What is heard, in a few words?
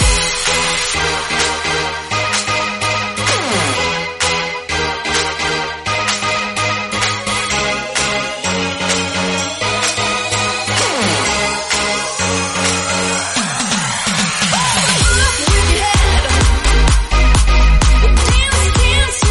Snippet 128bpm Abm